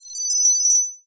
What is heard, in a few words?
Science Fiction Sci-Fi Electronic Ringing